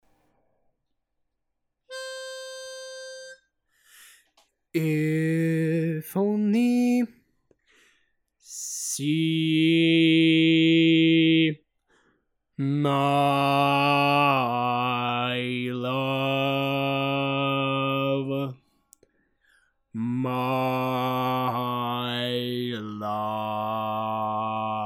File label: Bass, barbershop tags